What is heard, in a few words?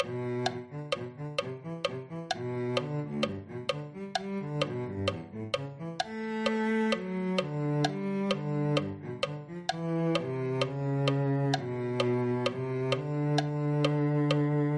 cello